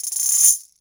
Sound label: Guir; EMU Orbit-3